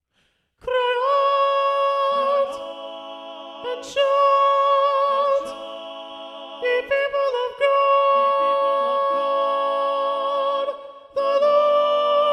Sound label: soprano 2 predominant; knut nystedt